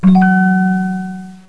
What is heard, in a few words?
note do